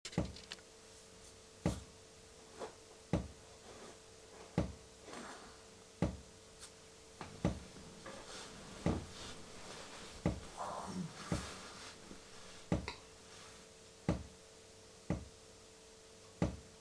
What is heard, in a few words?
heater